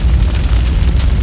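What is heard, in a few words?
fire ambience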